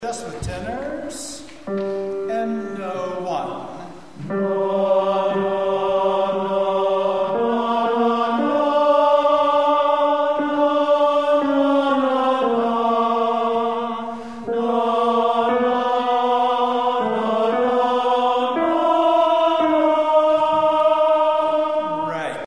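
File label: Serenade Tenors notes, rehearsal files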